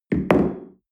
espresso place tablecloth

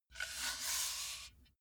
metal pole slide

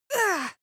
female combat hit soft; schreie; menschen

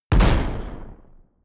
sci-fi gun mechanic fire hard; pistolen gewehre

